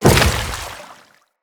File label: footstep in water, enemies; Footsteps; Grineer